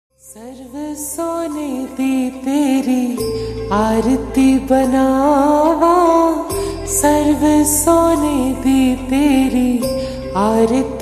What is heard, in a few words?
Slowed Reverb Ringtone